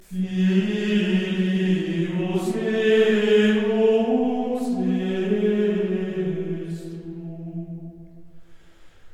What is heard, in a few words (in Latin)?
dominus melodia